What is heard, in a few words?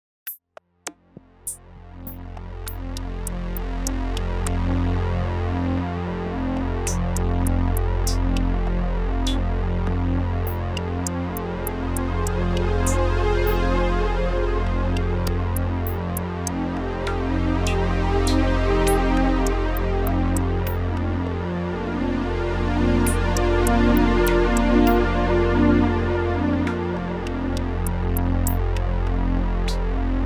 move seq pad